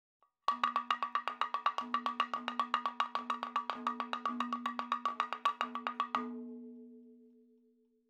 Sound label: 110 7 8 MADALAM FILLS, percussion